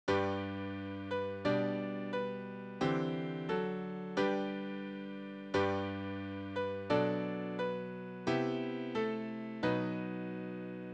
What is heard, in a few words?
Come Ye Thankful People Come easy piano